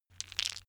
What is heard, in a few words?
stone gravel clatter short; natur-tiere; steine